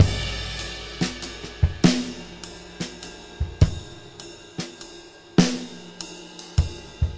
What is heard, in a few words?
six eight Loop, 100 to 105 bpm; Six-Eight Loops